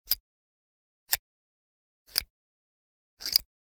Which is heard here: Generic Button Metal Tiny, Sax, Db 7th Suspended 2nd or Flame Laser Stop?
Generic Button Metal Tiny